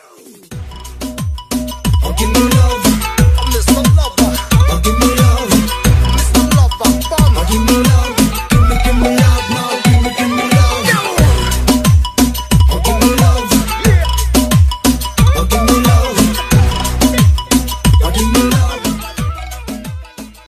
english ringtone

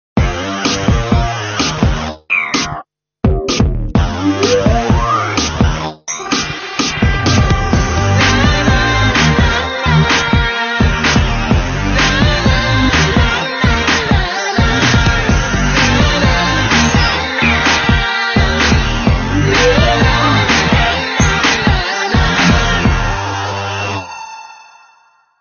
thrilling background music tone ringtone

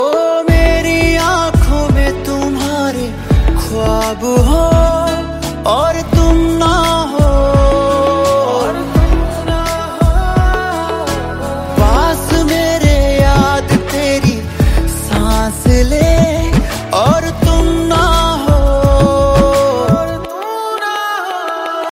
hindi ringtone, bollywood

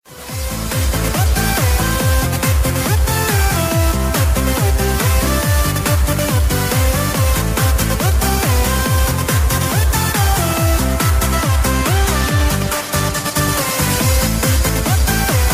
trance ringtone

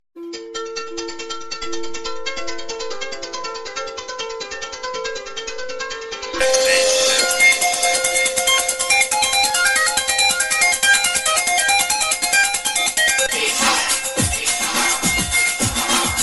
funny tamil ringtone

